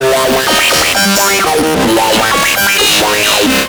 Geetar Stack, Underground UK House & Electro, Synths; Sonic Foundry (Sony Creative Software)